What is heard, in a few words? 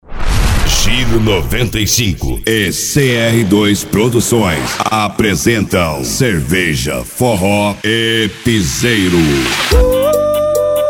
Forro e Piseiro; Cerveja